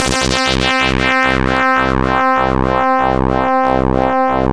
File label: MATRIX SWEEP, OBI DRONES; Zero-G Ambient 1